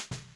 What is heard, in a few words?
Snare Bottom